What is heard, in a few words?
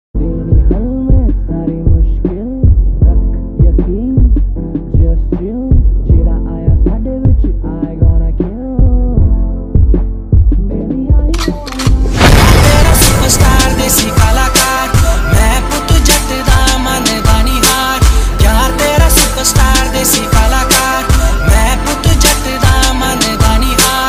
slowed reverb ringtone